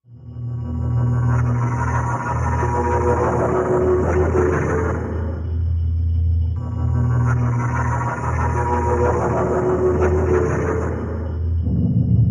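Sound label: Tolerance Reducer Machine, horror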